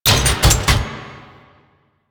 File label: Sniper Mech; Kahl Sniper